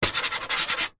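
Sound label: office pen scribble signature on metal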